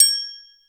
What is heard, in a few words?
wand, Triangle; Misc Percussion